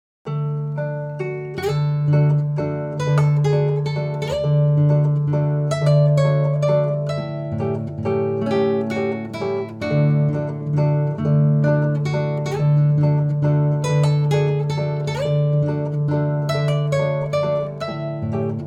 slide waltz, guitar

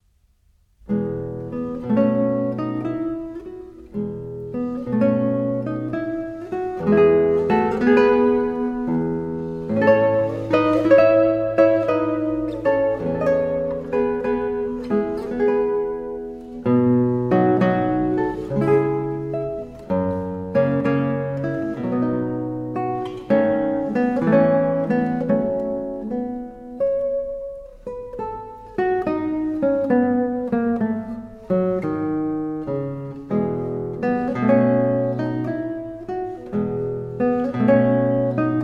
prelude

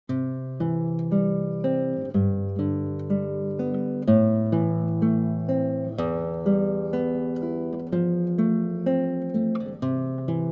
cannon

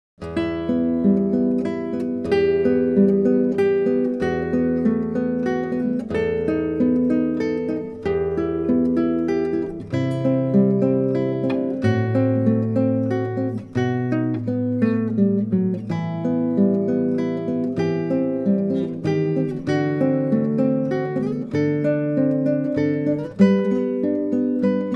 andante, guitar

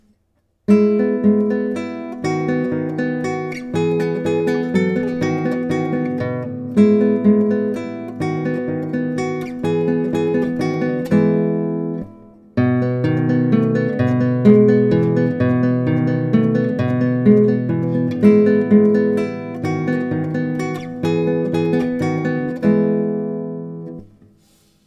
moderato, guitar

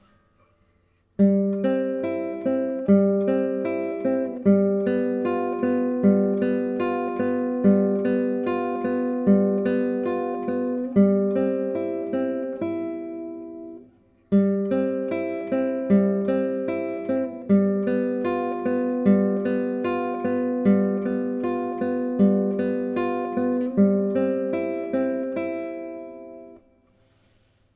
arpeggio

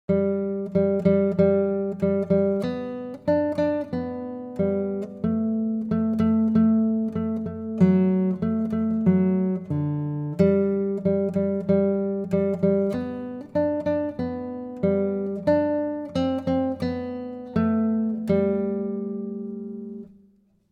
indian, guitar

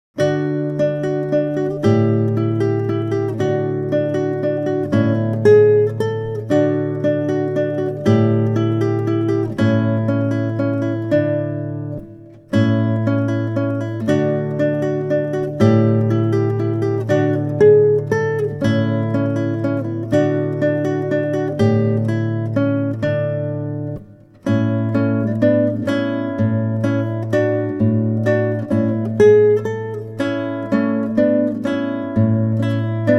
moderato, guitar

